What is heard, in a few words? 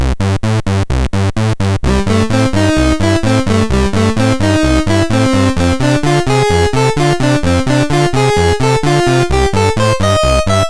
Boogie Beat, MOS6581R3; Super Dave